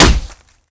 wood, bullet collide

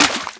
impact wpn small water

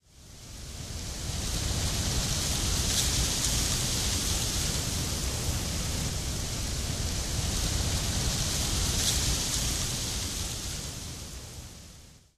wind, nature

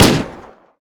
shot, weapons

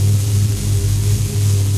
mon work

